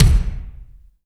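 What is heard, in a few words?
EFEX bass drum, FX DRUM; AKAI S6000 CD-ROM - Volume 1; DRUM RYTHM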